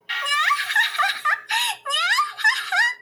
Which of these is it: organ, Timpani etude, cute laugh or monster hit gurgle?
cute laugh